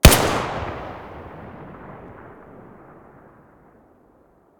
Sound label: tt33 muzzle mono